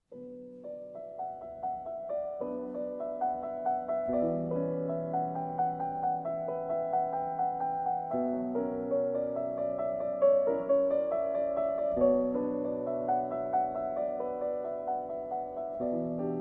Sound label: Prelude in B Minor (Concert Transcription); By A. Siloti